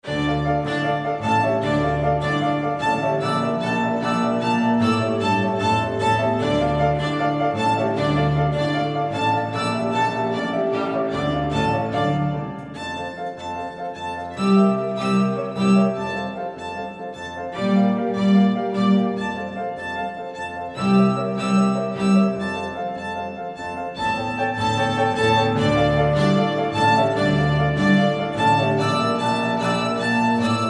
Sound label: Harmonic Rumba